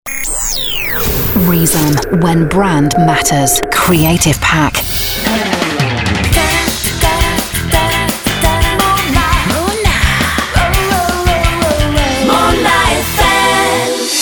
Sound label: Full Sung Jingles; Mona FM Hiver 2K10; Reezom